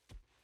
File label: dragon footsteps; prosoundfx